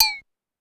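Cowbell Down Less, Drum Machines Clavia DDrum-AT Pitch Envelope; 300 Drum Machines; Pitch Env Various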